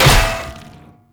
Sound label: Great Sword Impact Armour, Combat; Weapon Impacts; Player; Swords; Founders Great Sword